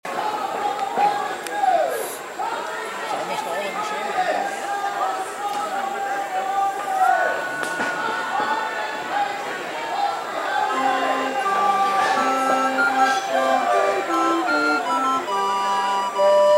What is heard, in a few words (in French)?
flutes a bec